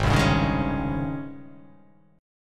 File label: Ab Major 11th Ab; AbM11 strummed; JGuitar